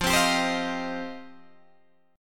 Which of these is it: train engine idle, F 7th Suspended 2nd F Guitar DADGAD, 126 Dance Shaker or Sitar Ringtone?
F 7th Suspended 2nd F Guitar DADGAD